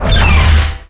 Crane Machinery dmg; FlyForInsanity

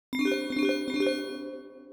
ringtone; Microsoft Office; Lync

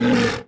hurt; FlyForInsanity

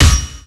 Equip Weapon; FlyForInsanity

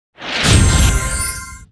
Special Hit; Fly For Insanity

